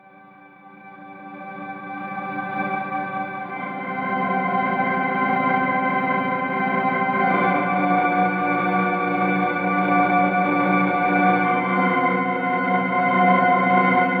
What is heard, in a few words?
organ mix